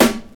00s natural snare sound a key, Royality free steel snare drum tuned to the A note; snare drum samples